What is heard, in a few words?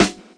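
classic hip hop Snare; Classic Hip Hop Mega Kit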